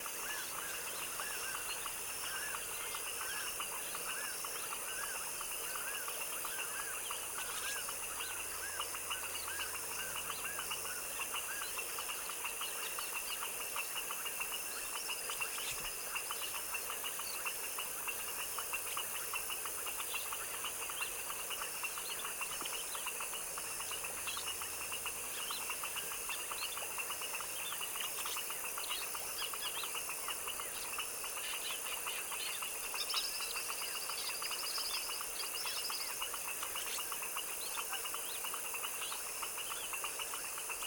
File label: TROPICAL Wetland swamp evening foaming frog dusk chorus; Articulated Sounds; Tropical Ambiences